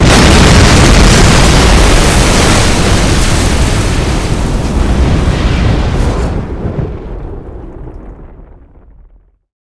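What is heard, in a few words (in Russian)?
громкий сильный боевой пушечный выстрел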